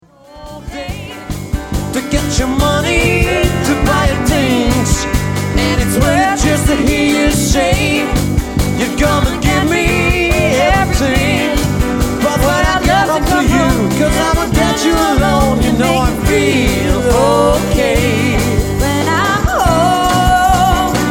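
Quartet (vocals, guitar + backing vocals, bass, drums)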